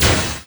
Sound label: Scifi Shield Hit Deflect; Energy & Forcefields